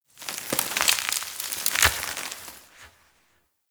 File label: Forest Branch Snap Crunch; Warframe; Grineer Forest